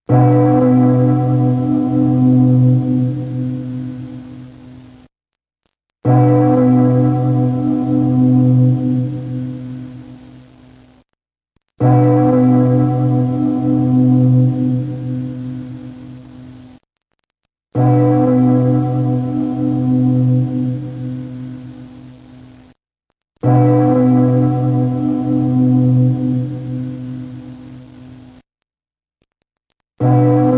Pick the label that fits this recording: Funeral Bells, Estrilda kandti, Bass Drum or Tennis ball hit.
Funeral Bells